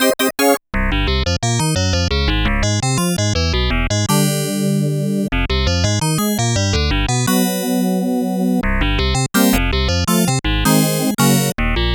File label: FM Test